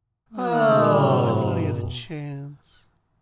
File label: Disappointed crowd, stacked; Judge John Hodgman